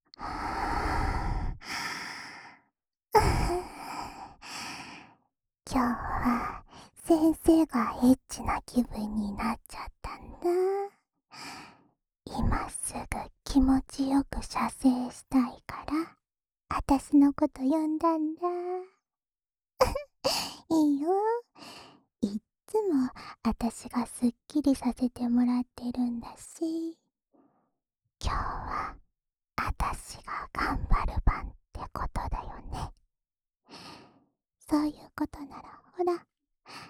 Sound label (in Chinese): 饱含唾液深深的舔耳循环（右耳）; 辅助自慰用